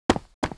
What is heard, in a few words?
Mob raid run; Monster; 레이드; 적수신마